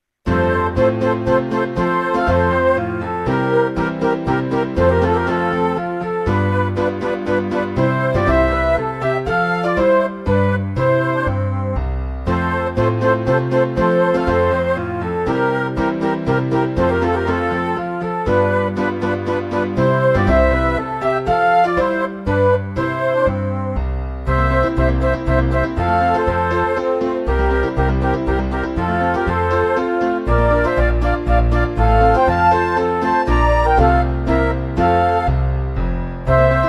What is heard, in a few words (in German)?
Menuett